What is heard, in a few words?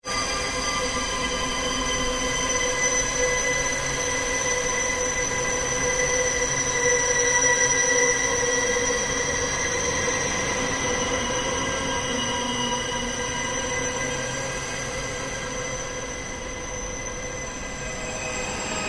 Ambient Drone Ghost Chatter Scream; Sound effects created by manipulating other sounds; Scary Soundscape Sound Effects